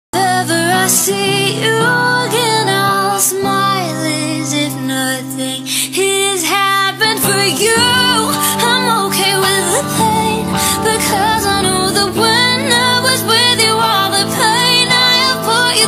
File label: korean ringtone